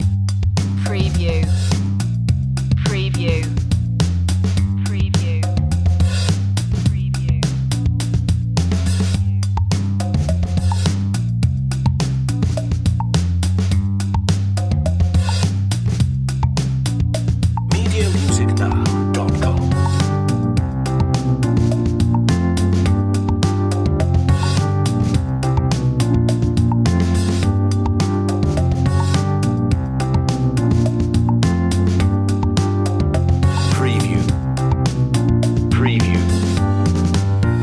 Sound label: groove